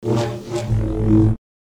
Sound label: Saber; FXHome